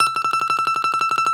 BALALAIKA, Prima Balalaika, Plucked Strings

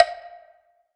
rom block F, leads

